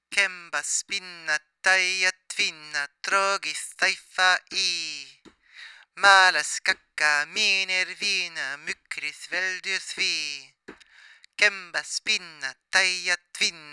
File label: Icelandic Spinning Verse; pagan